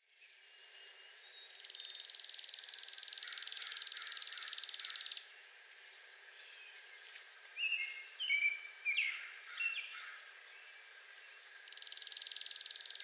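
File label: Chipping Sparrow 5 Songs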